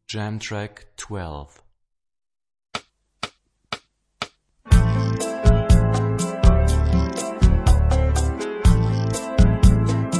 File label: Rock guitar; Rock gt Secrets